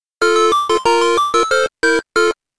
Fanfare!

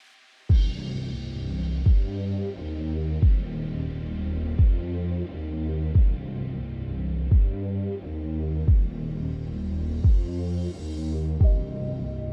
Warm Up, Workout Soundscape

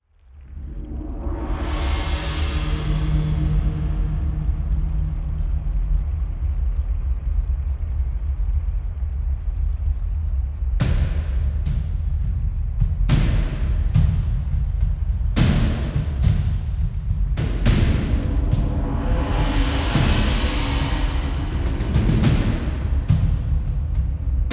A Close Battle; Sword Art Online OST

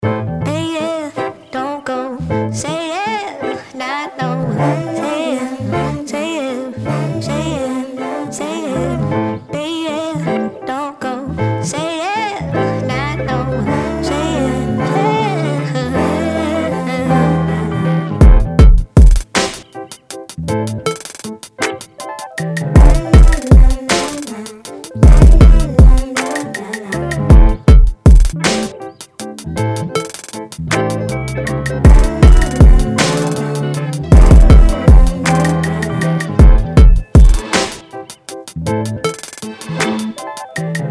Instrumental

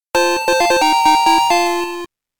Fanfare! Get Item; Pokemon OST Collection; Pokemon Gold Silver Crystal OST

